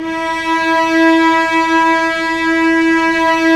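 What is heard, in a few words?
cellos f ambient; Roland LCDP13 String Sections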